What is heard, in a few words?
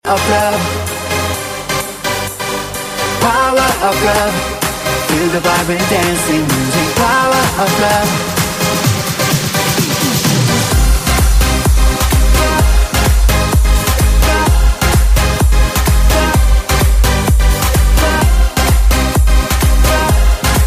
norwegian power tune